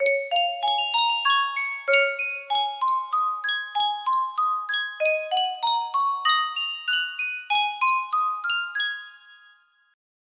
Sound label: sankyo miniature music box